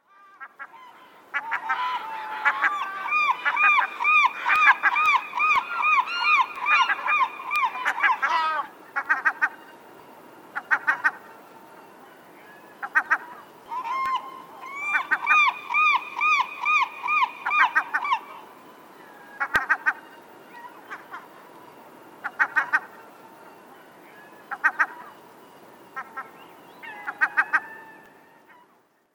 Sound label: Glaucous winged Gull